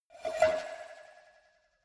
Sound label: cowbell scratch hit move soft